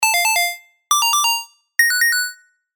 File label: Sylenth1 arp, chiptune; Pause